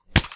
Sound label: boxing punch